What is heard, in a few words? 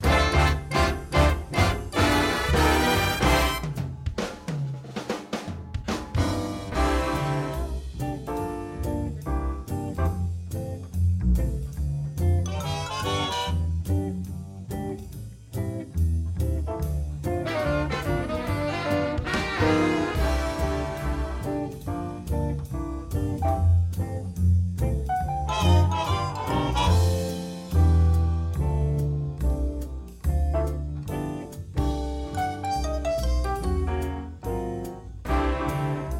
Duet, Karaoke Jazz; The Sims 4 City Living; macOS; PS4; Windows; Xbox One; Xbox Series X/S